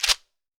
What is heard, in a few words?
CHEKERE; AKAI S-Series CD-ROM Sound Library VOL-5